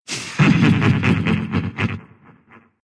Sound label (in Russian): laugh; ru